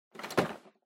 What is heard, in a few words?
TV Hardware Pick Up